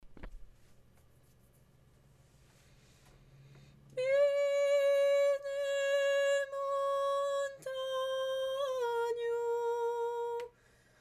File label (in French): basse; Ateliers chant; a biasgina